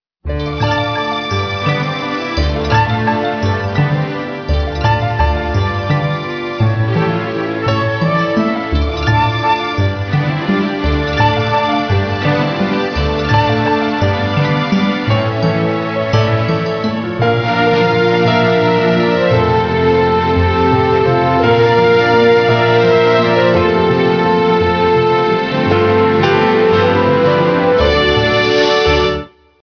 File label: kithar sound